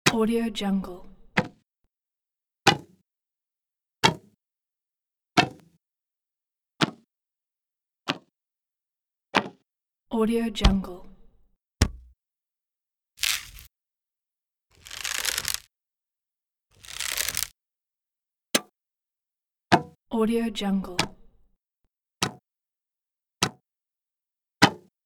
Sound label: bow release pack